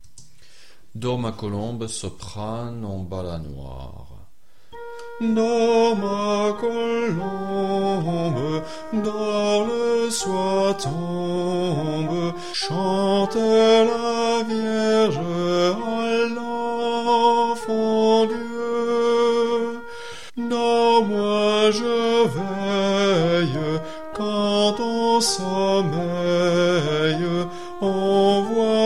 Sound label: soprano; Dors ma colombe